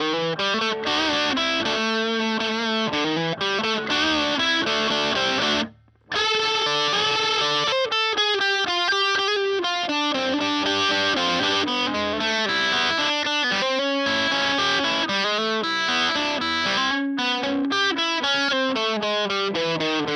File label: Epiphone SG Bridge